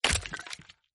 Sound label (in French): squelette tp